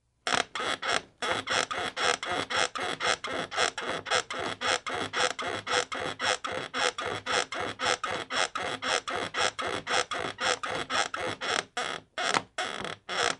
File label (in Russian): skrip krovati